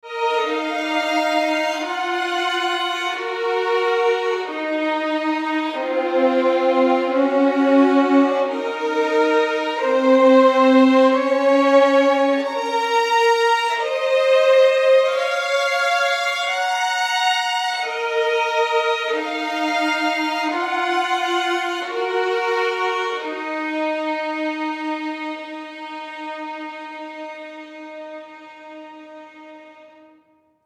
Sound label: violins mix